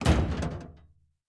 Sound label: door metal close end; Rust